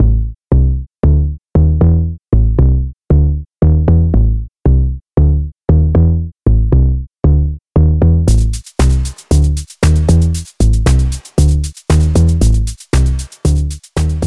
solid bass

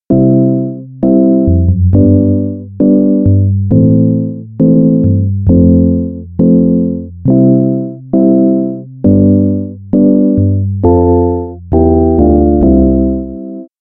epiano

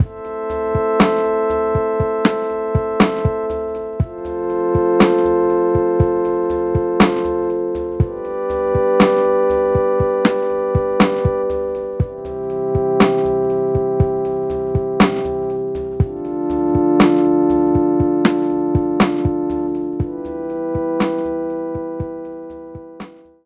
brass pad

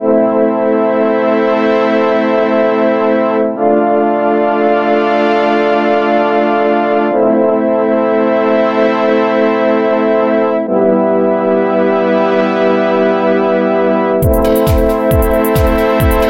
pwm strings

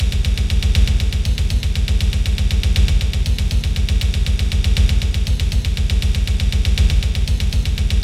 all bd sample

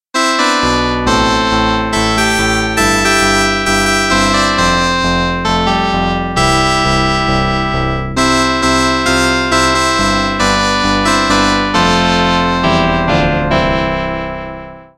clavi

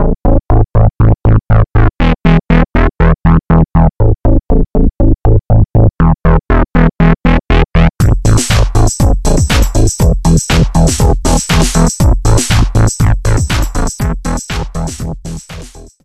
acid bass

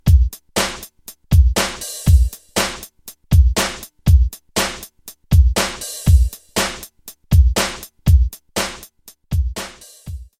sp303 120bpm

